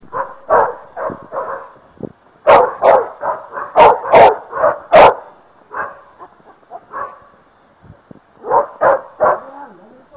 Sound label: dog; henro